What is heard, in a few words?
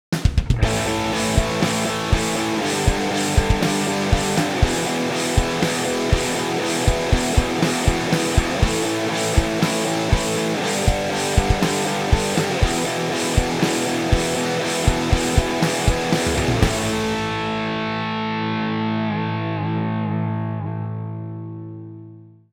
Rock